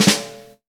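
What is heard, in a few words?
Soft Snare Ghost, Roland BK-5; Snares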